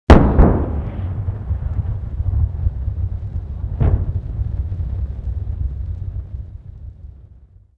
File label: Artillery; Red Orchestra Ostfront 41-45